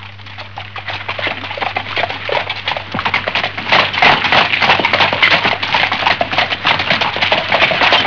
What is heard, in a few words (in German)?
PFERD